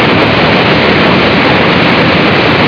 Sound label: waterfall